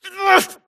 grunt death quick; Titanfall 2

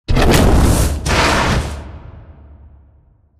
Explosion Rumble Exterior Close Texture; Boomtown; Titanfall 2

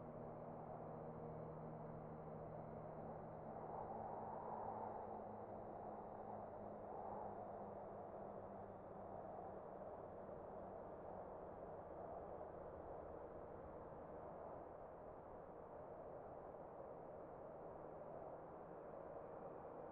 closed room; Titanfall 2

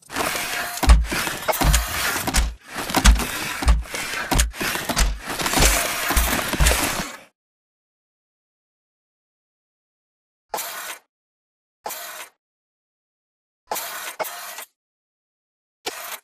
walk to hatch and open; spyglass intro; Titanfall 2

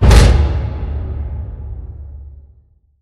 pod door lg verb open start; Titanfall 2

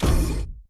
footstep sprint close; Titanfall 2

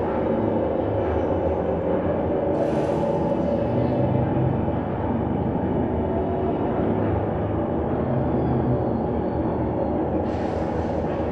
Robot Arm Track Med Dist; Boomtown; Titanfall 2

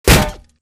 bullet heavyballistic titan; Titanfall 2

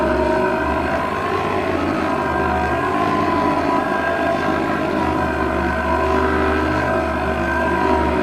Prop Warehouse Prop Rack Move Close; Titanfall 2; Boomtown

